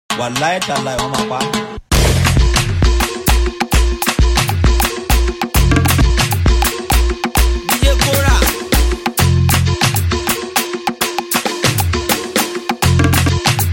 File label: Random Dance